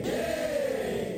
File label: yeeey